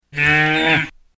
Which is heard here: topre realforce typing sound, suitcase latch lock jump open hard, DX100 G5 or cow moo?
cow moo